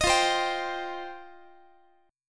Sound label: F 7th Suspended 2nd F down Guitar; F7sus2; strummed; JGuitar